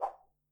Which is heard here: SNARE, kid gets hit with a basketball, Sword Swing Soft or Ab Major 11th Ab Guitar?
Sword Swing Soft